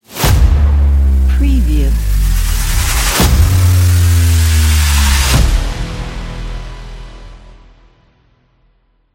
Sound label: from inside black hole